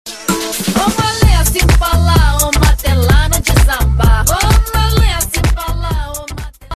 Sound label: musica; tecnohard